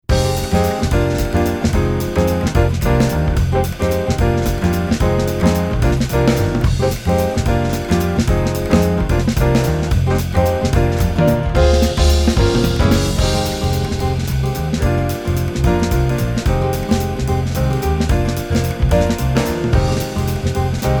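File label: Jazz Waltz Chord guitar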